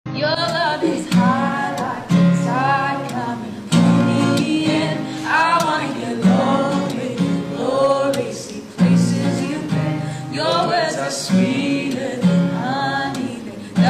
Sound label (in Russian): Rington na zvonok telefona